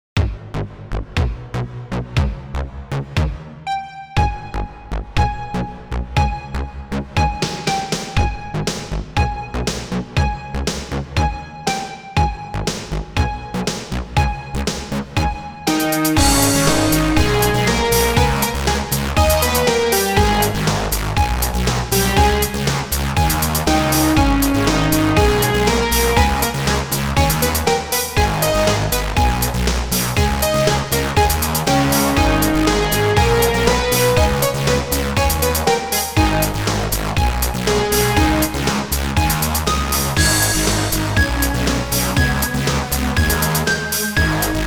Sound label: Hard Bass